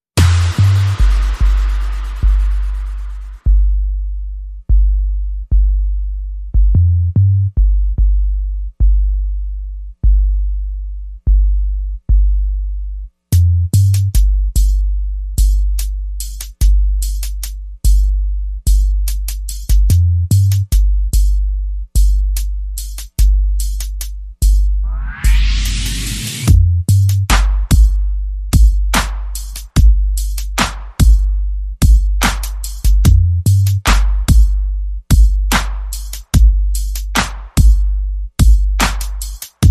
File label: Drums and Bass Only